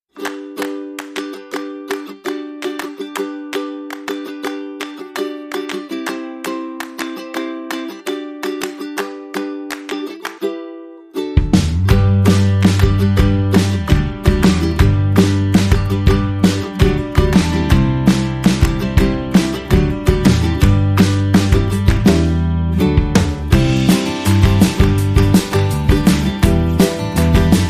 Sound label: No Melody and Piano